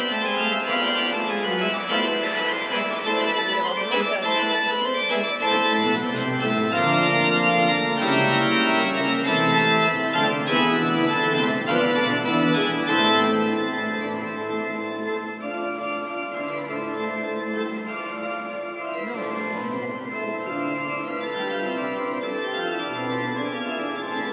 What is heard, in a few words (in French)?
Orgues